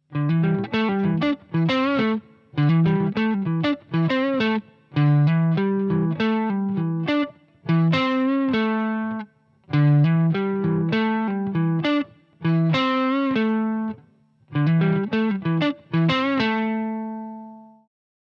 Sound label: Pentatonic Lick, guitar